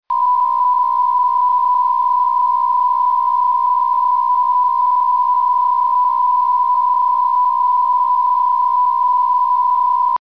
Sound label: psk63f